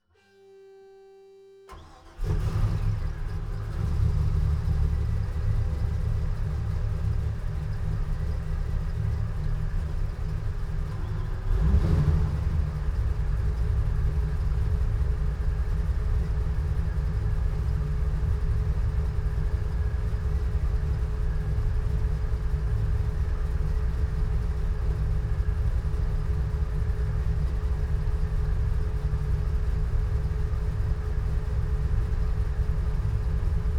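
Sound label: Cigarette Top Gun 39 Onboard Idle Various Engine Rear Left; 2012; MKH8020; Pole Position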